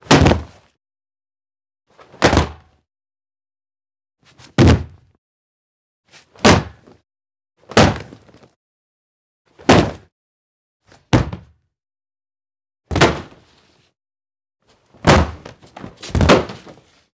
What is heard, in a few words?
BF To Hardwood; Fairlight Sound Lib